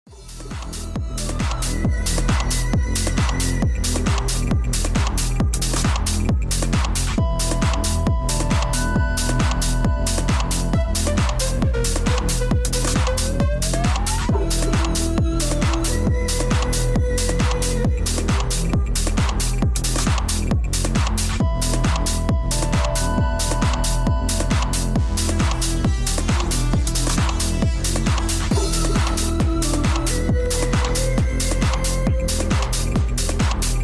pause loop; Rafis tkori r

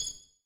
menu click; Aristia rgby

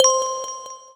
menu hit; Nino

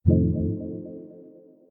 match not ready; Seele 【selyu】

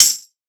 drum hit whistle; Azer+ 2023

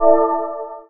click close; akani-skins; Sunset Horizons; seysant

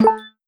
match start; Snowy Circles NM (v1.1)

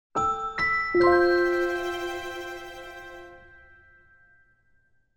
fail sound; idke 1.1

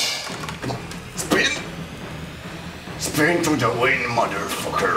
spinnerspin; BOIIII idk MIX Skin

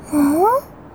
combobreak; Katou Megumi

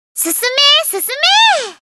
applause; TheLegendaryMinalinsky

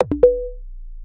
pause retry click

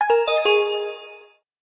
menu back; Chitanda Sagiri+blue angel+changed version

